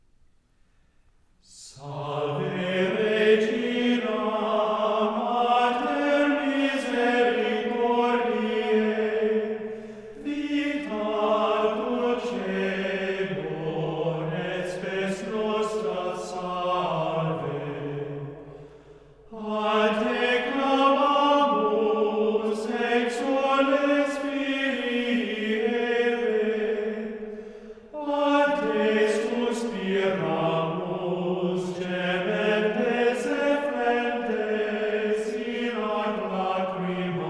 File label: Salve regina antiphon in mode 5, Chants; Salve regina (simple mode); The Chants of Mary